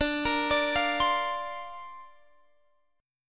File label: D-Minor 7th Sharp 5th D Guitar Standard, chord; Dm7#5; arpeggiated